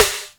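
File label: snare drum; Best Service Gigapack 2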